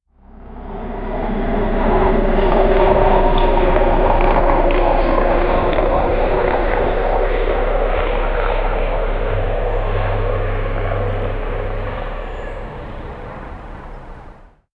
the sound of a helicopter; aircraft

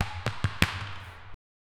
a basketball hits the met

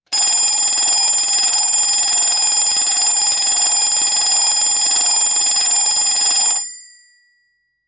old mechanical alarm cloc

